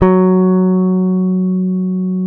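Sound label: P BASS SUSTAIN F#3; Sampleheads; Will Lee Bass Library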